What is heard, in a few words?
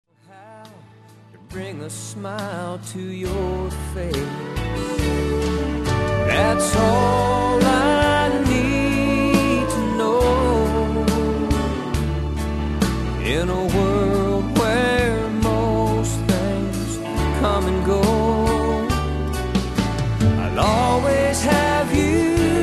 with band